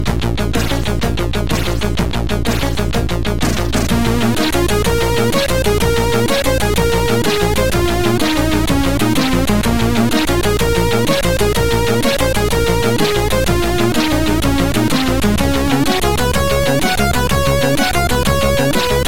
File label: 8bit